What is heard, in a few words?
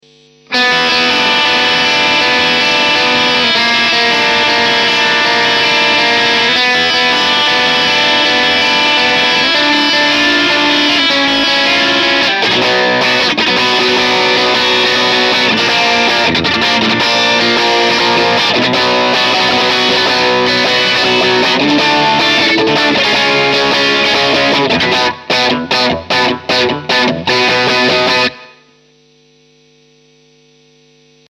Rock